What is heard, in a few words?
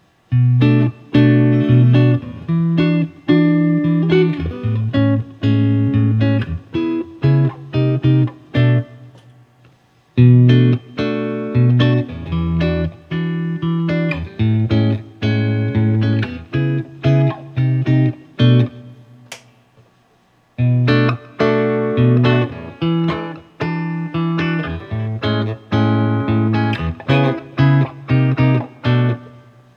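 Guild X500T Finger Pick, guitar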